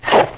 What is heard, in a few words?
SLICE